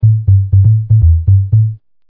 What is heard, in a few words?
funk, bass